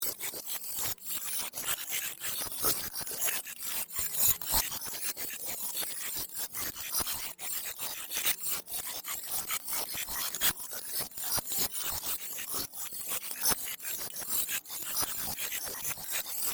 Created Ambience Robotic Texting Loop, Artificial Sound Effects; Various Ambience created through sound manipulation in FMOD